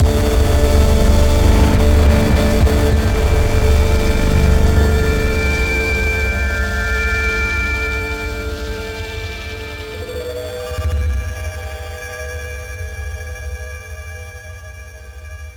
BOSS DEAD; Hyper Light Drifter

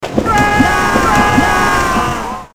Going Down A Whirlpool; Heroes of Might and Magic: A Strategic Quest; 3DO; MS-DOS

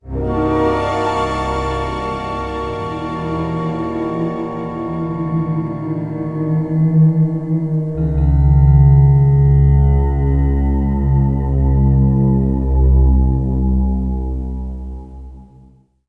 reveal; Star Wars Bounty Hunter

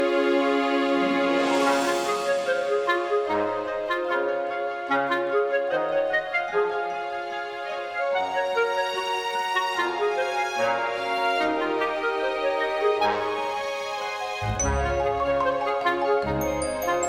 music smurf village

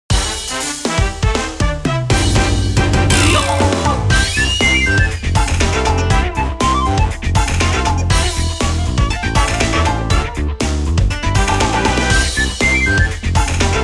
Jingle Name; Taiko no Tatsujin V Version; PS Vita

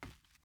wood step

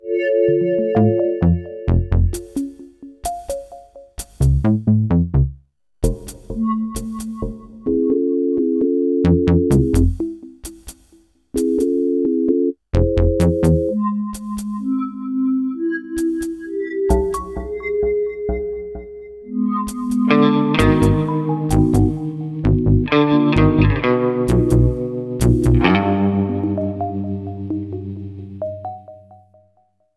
Music Downbeat Plants

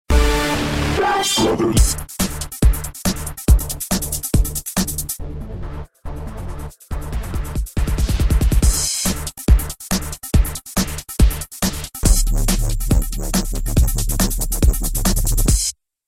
Finish line Vote Screen Song; Rush Bros.

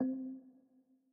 Move C 3 Mp

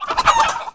Hit Cock; Toy Story Mania

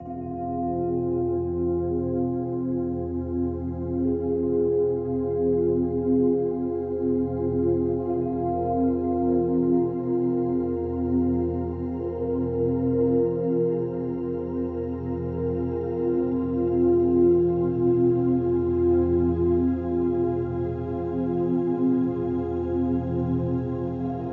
Mysterious Ambient Soundscape; Gedonia

